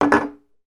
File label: beer bottle put down on worktop; foley